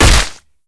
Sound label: blunt hit; matmos interactions